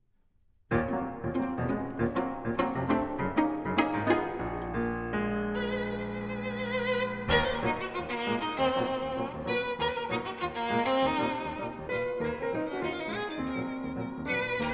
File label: Preludes for piano: No. 3, in E flat minor